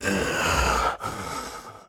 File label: Walk Cycle Burns; The Town